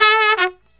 TRUMP, Killer Brass Riffs

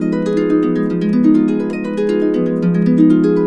KUBLA F, Complete Harps; USB Soundscan vol.62; AKAI; KUBLA 70

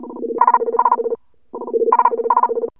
PULSAR, MISC VCS; Zero-G Ambient 1

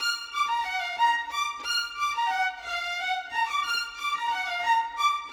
Violin; Sonic Foundry (Sony Creative Software); Orchestral VOL-2; Modern 07

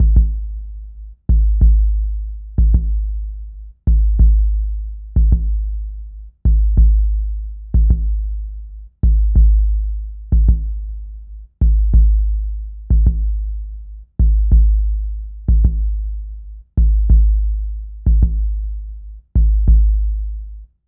Coroner Kick; Zero-G Smoke

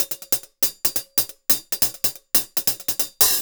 Top Ends; Zero-G; Monster Beats

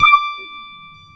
WOW GT1-D5, WOW GUITAR; AKAI S-Series CD-ROM Sound Library VOL-7

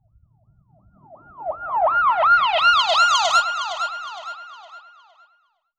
Filter Proc, alarm siren, 130bpm; musicradar

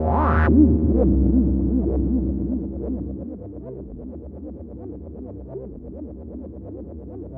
FX loop Cm; Trance Explosion Vol1

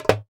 Doholla Stop, Percussion

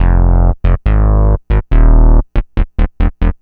Bass, Loops; Trip-Hop

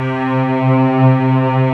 Textural Strings

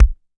SDS5 kick, Simmons; Simmons sds5 kits

